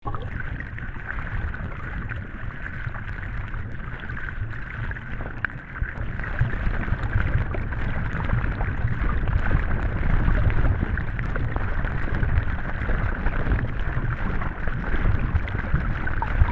motion, monaural recording, YBCA Fountain, San Francisco CA